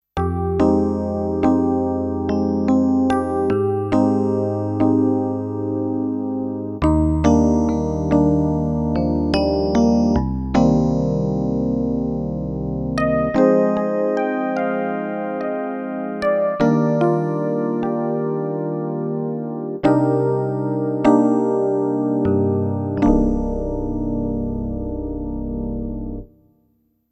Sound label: Korg D1 E Piano